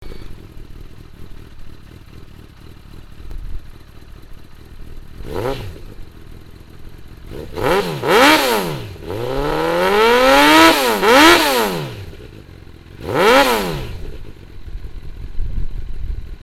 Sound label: CBR1000RR RT-1 Titanium SlipOn, exhaust sound; honda; Jardine